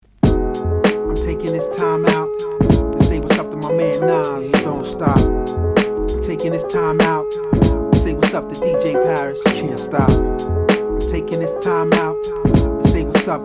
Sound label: funky